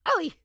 grandma short yell; Blind Drive

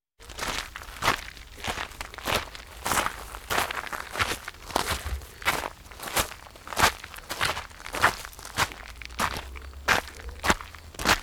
Walking Machinery Trucks

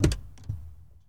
Truck Explorer Door Open; Trucks

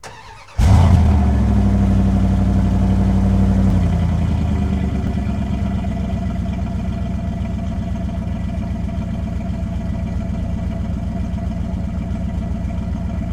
Truck Dakota Start Idle Off; Trucks

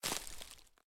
Dead Bush; Blind Quest - The Frost Demon

